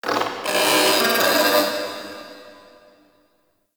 Robotic Chatter; Warframe; Guild

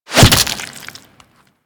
Desert Skate Barb Hit Flesh C; Warframe; Attacks; Hit Effects

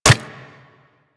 Nunchaku Catch; Warframe; Tenno

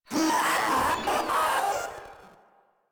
Proto Infested Runner Vocal Attack; Warframe

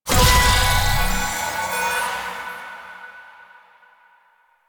Game Mode Start; Warframe; Nineteeen Ninety Nine; PvPvE

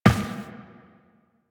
Step Glass, Footsteps, Walk; DE; Warframe; Tenno

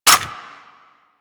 Lever Action Pistol Mech; Grineer

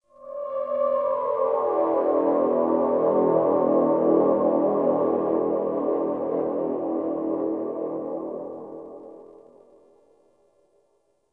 Eerie Drone

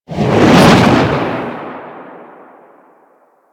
Infested Golem Hand Swing; Warframe

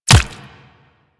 Player Bullet Impact Flesh; Warframe; Weapons

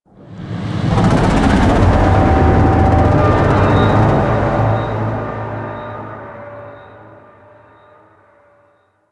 Void Dragon Vocal Distant Idle; Warframe; Duviri

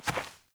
Fawn Step; Encounters; Animal Rescue

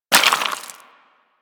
Bullet Impact Debris Rock; Warframe; Weapons

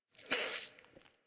Wheeze Close; Warframe; Infested Eris

